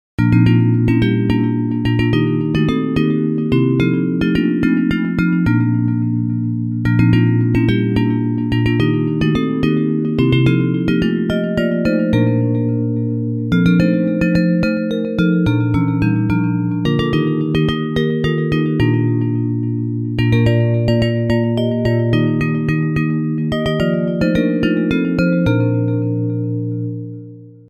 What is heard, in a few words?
Bells; The Old Rugged Cross; Praise